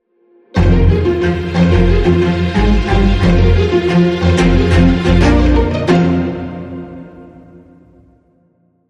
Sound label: Sting No Drums